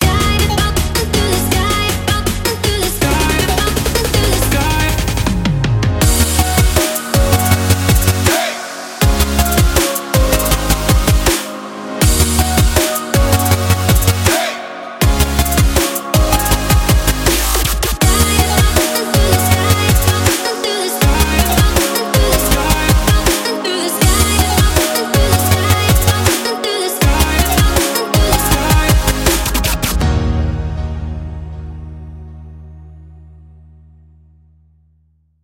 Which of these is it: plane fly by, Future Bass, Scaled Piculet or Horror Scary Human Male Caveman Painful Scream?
Future Bass